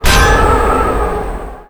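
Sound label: Elemental wind shield effect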